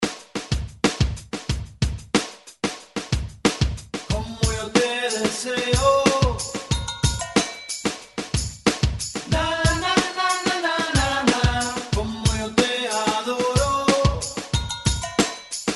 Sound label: 90s Latin 92Bpm; DJDOLLS